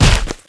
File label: punch